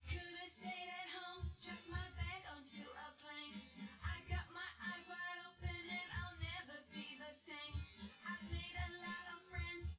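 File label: with drums, synth conv, 250ms, 1m; SiSEC10; underdetermined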